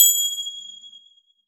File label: ambient ride cymbal single shot g sharp key; cymbal drum